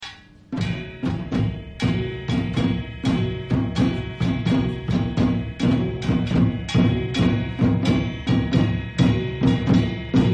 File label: korea drums